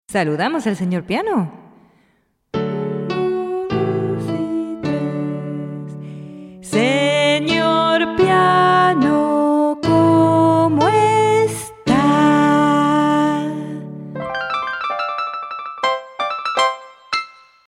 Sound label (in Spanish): piano feliz